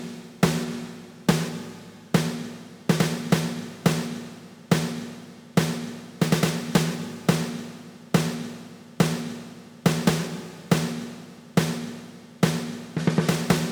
Alien Snare; gamerip; Bust-n-Rush

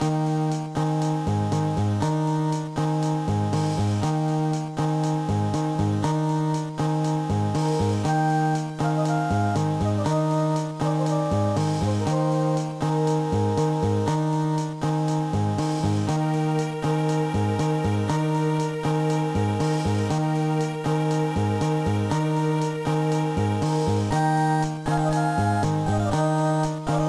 BGM; ochaken no bouken jima honwaka yume no island; gba

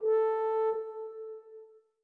orbit hornsolo; Sky Children of the Light gamerip

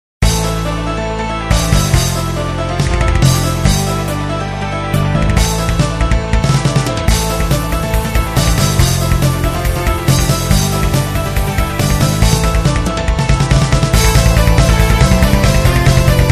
battle grass; Monster Pinball Ultra Flashy Tensile Battle Game; Chronus App Inc; Android

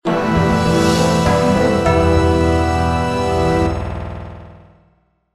completed; Temple Trekking

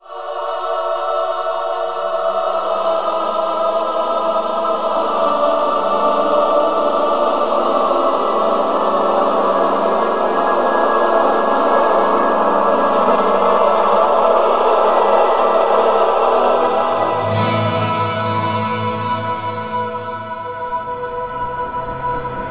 Action; Diablo II Lord of Destruction

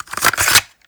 Remove Cassette

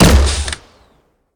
Crossbow Shot

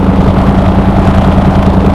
tractor off very low

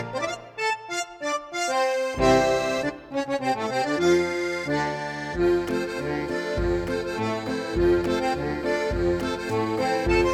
Minigame Loop

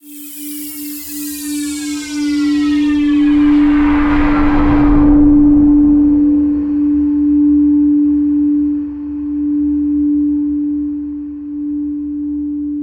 Transition End

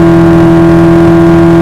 camaro off mid

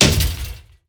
Collision Trash Can

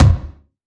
Kick Chroma

